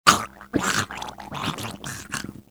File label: throat, pain death; fmale; Soldier Of Fortune II Double Helix; XBOX